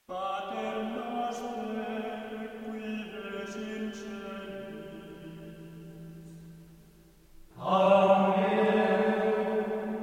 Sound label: Altspanische Gesänge - Pater noster, Gregorian chant; Abadía de Santo Domingo de Silos